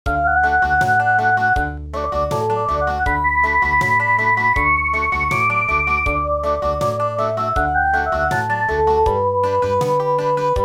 Meditation Country; midi; traditional

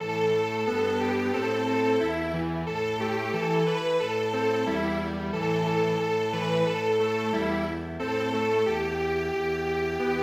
Strings Quartet

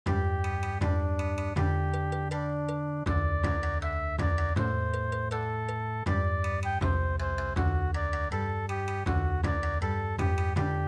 Bon Odori; cuckoos song

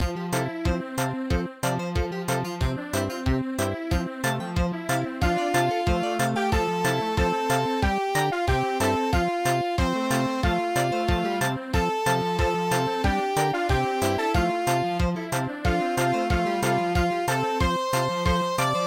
parade; ALOUETTE GENTILLE ALOUETTE

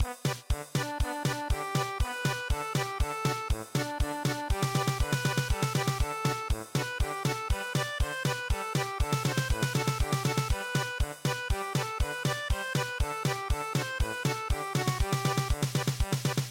German Polka

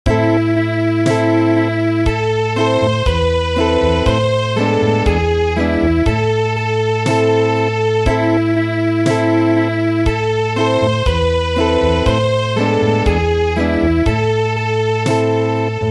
Acoustic

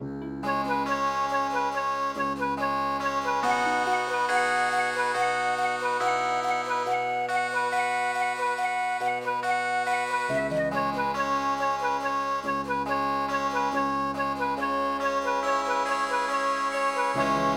camel bell Healing Harp

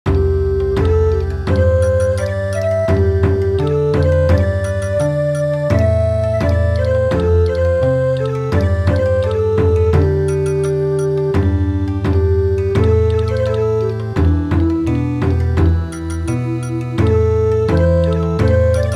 Bon Odori; midi; traditional

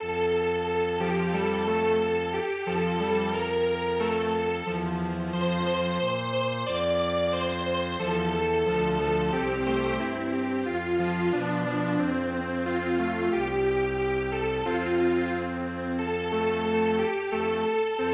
Strings Quartet, traditional

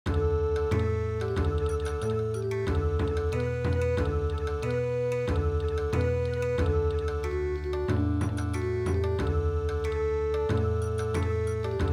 Bon Odori

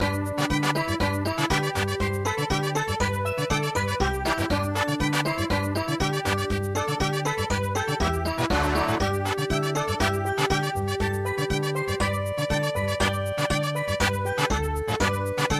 mambo; Arirang

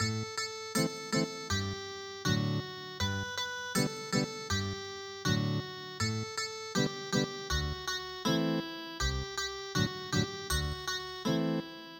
Ethnic Guitar, midi traditional

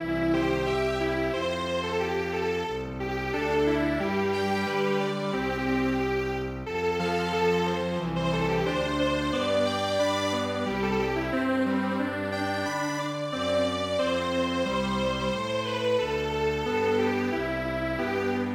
yume humei Strings Quartet

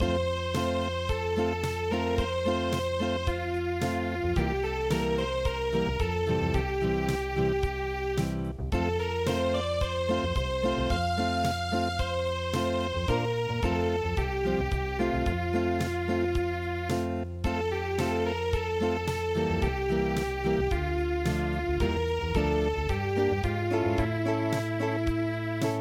Acoustic

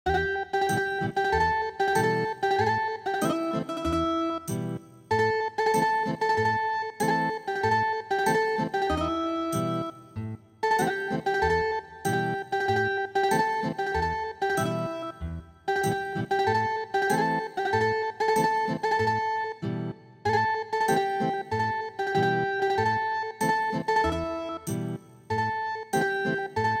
Ethnic Guitar, traditional

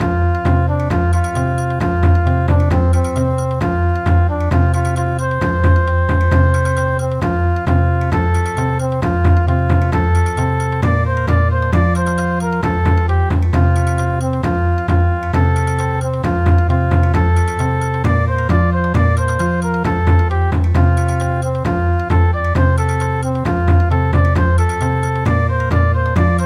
Bon Odori, midi

